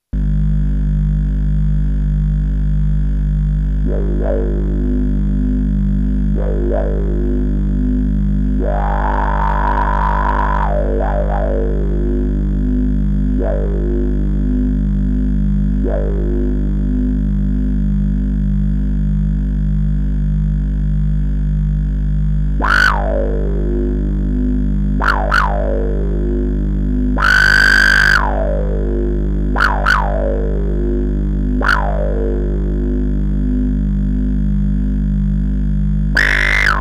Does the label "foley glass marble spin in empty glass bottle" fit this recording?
No